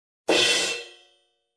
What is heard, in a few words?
Drum crash hit and stop variant